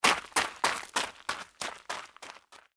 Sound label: walk gravel